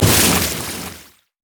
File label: bio arcane barrage; LoL; kogmaw